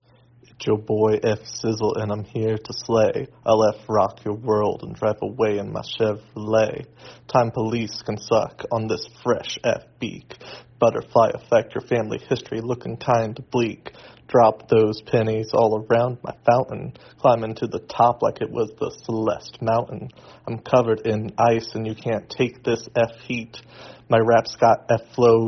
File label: rap